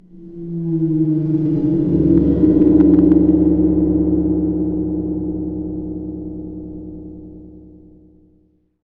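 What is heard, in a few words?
CYMBAL GONG; NorthStar - Global Instruments VOL-2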